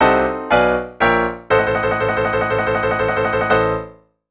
Bad guy music

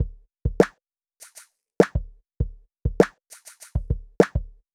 Drum Effect Envelope Filter

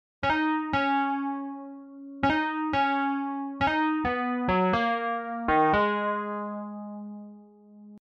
MELODY

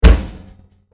Oven Hit

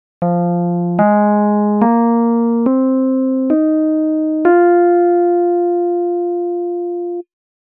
F Minor pentatonic scale

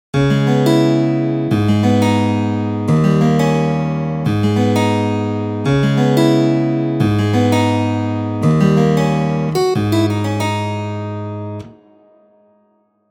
chords

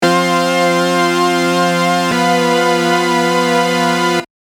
Dm7 G7

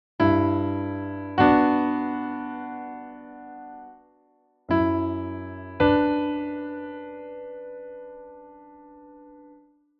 chorus verticalized

